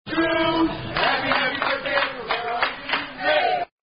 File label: Happy Happy Birthday; 16th Birthday At Red Robins!